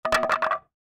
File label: Wood Dropping Sound